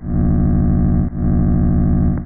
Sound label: GOOP; Monster Media Number 15